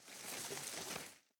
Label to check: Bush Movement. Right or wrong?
Right